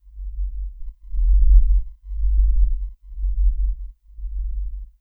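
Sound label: tranq wobble left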